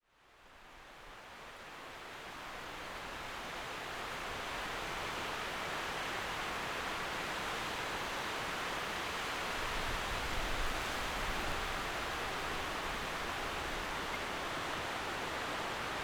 Wind 7A, B.C. Pine Forest

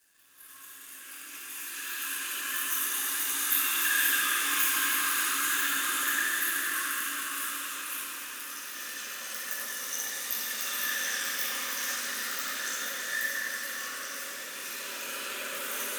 Splashes Trickle, Chalice Well

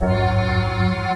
accord guit

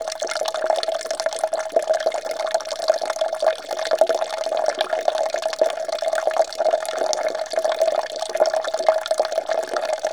Water Stream; Chalice Well

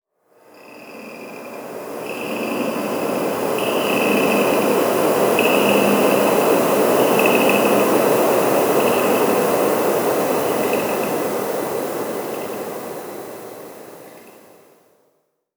Creak; Aeolian Voices

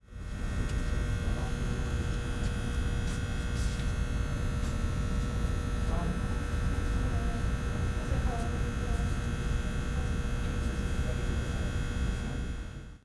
Fluorescent Hum

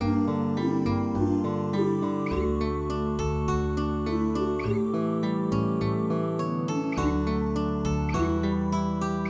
stem acous guitar

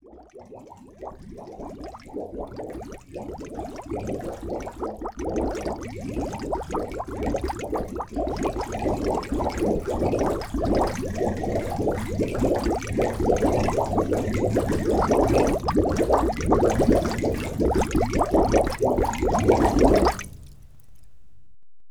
bubbles

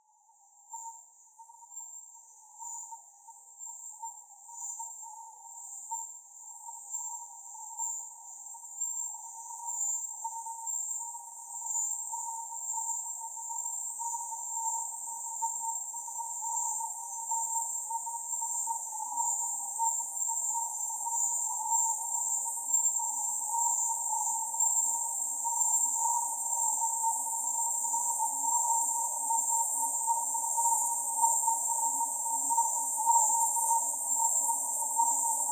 Squirrel, Garden

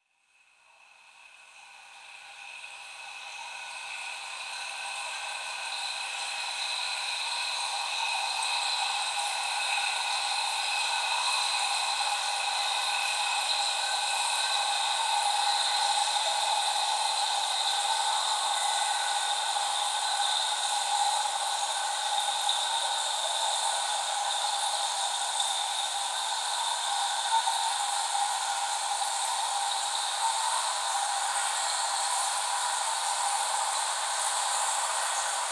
Well Trickle, Chalice Well

